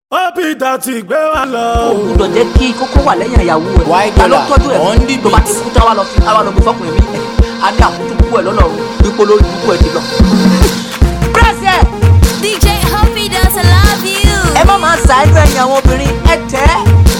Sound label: Cruise Beat Instrumental